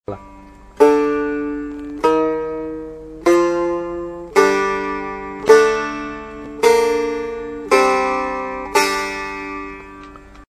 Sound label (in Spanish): nava escala, Musica persa